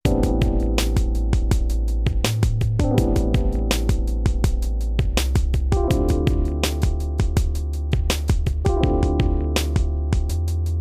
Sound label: Lab Chill; DVDlab Studio; Music